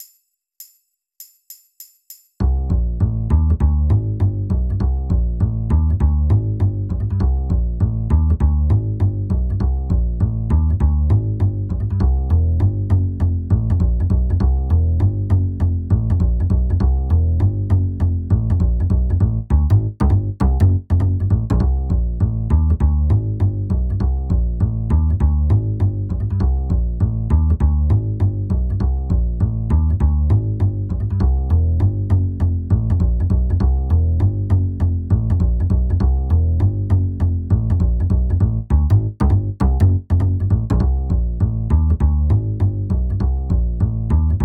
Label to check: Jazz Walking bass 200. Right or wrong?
Right